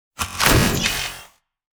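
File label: tripmine explosion digital; weapons; Half Life Alyx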